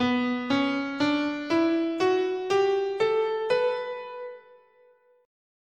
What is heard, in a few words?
B Aeolian, scale